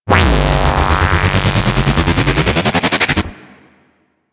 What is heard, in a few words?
Laser Spin Ability Charge; Warframe; Corpus; Ambulas